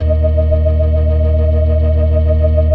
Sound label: FAST VIB, TREMELO+VIB, Synth and Organ